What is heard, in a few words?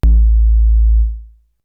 808, Drums; ZERO G - Datafile DISC 3